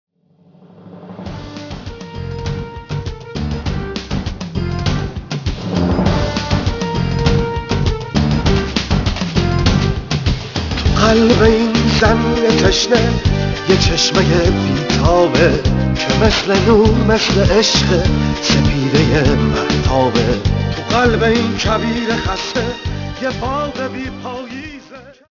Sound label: Ringtone